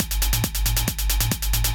Tech loop

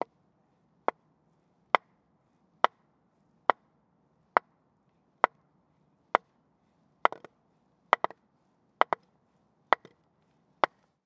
Wooden Hammer Hitting Stone Surface

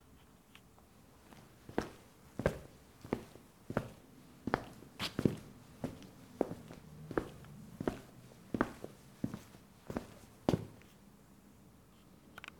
Steps Boots On Hard Surface

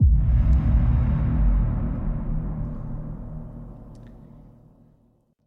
Impact Stacked

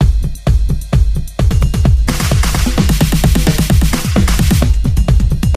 Hip Hop Beat

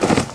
Body Fall Over

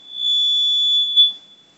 Mic Feed

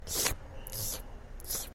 Slurp

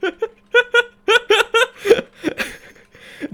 Wholesome Genuine Laugh Male

